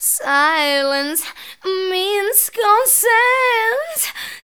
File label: female dance vocals; Best Service ProSamples vol.12; AKAI